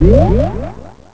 Element Pedestal; MC; Elements floating onto pedestals in the Sanctuary (also used when Gustaf appears)

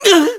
Hurt; Yuga getting hurt; Zelda

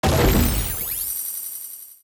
Hammer Block; Fire Temple

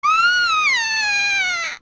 Scream; Nabooru; zelda; OOT

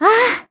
Zelda Ah; Skyward Sword

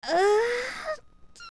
Young Yawn; Link yawning

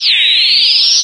Entrance; Zelda's fight entrance

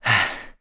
Recover Low; recovering from slightly low stamina; Link; Skyward Sword; zelda

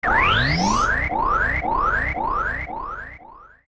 Mirror Shield Absorb; Twinrova's blasts

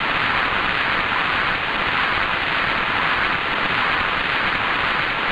Rain Out; Zelda; LTTP

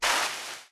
Link Slide; Link sliding; The Wind Waker; Zelda

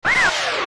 Torch Slug Hit; OOT

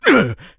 Sumo Slap; Sumo match: Bo slapping Link

